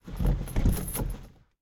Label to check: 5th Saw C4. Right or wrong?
Wrong